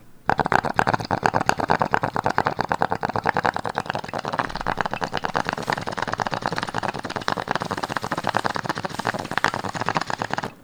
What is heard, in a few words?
bong